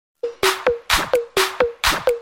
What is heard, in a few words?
Message Tone